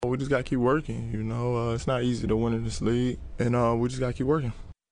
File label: funk